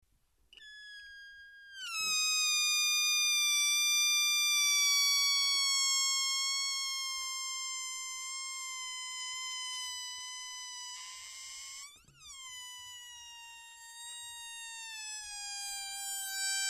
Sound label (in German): quitschender luftballon